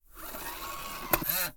disk insert, machine

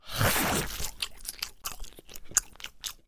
eat meat

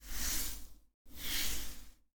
head stroke

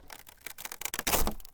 wooden board

